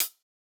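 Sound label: Drumloops Beat 128 BPM Solo closed hi-hat; One Shots; VEC5 Multi Loops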